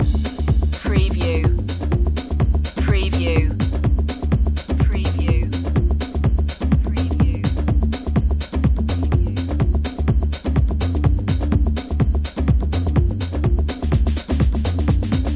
pulse line loop

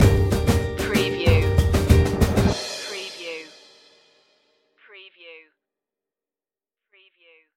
sovereign sun stinger

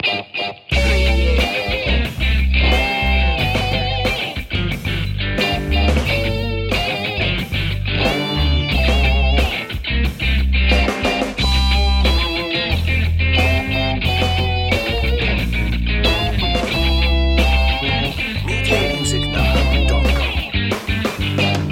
happy birthday funk

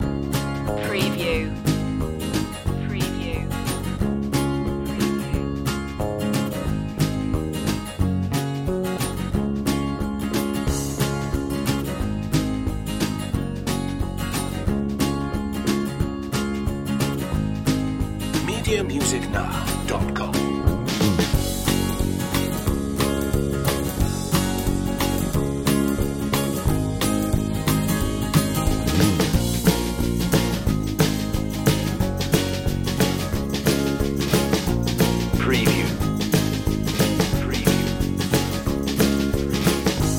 back home loop

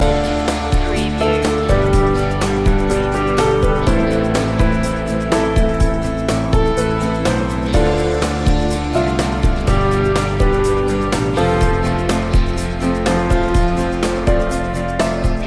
casual goings loop

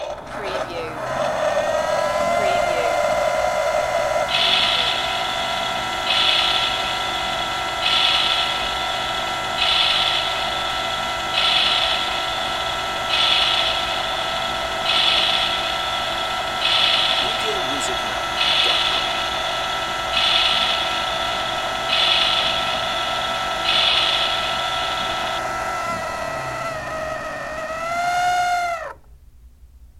Toy Grill

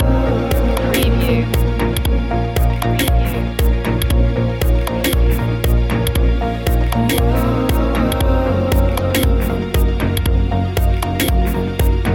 ethereal engine loop

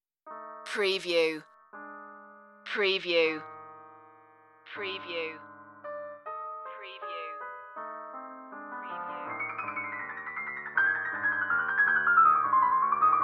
piano roaming retro lo-fi

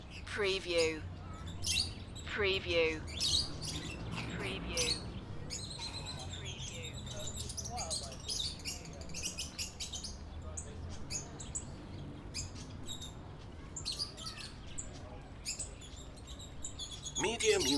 Birds in City Park Aviary

